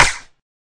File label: CLAP, Volca; STYLE KITS (Hip Hop, Etc); WallsVado Volca Sample Pack